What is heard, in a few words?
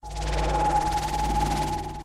Dragon Stun